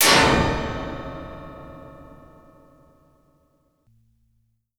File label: Clanger, Drum & Bass Hits & Bits; Total Drum&Bass; 90 s Sample CDs